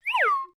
pie cast; dota; ringmaster

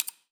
wheel spin click; ui; economy

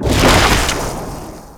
teleport in; weapons; dota; furion

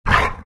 grunt, greevil; npc; courier

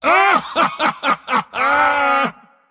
mars laugh; dota

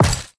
metalsmall drop; ui; inventory

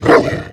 death gnoll, creep deaths; dota

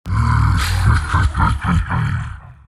laugh; abyssal underlord

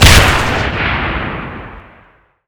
storm bolt impact; sven

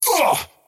skywrath crown pain; skywrath mage

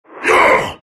shards pain; terrorblade

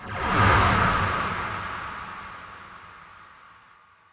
boomerang slow; weapons; dota; hoodwink

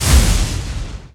general attack; zuus

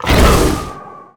arctic attack; winter wyvern

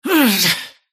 attack pain; dota; kez

